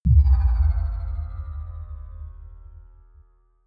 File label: sci fi bell bass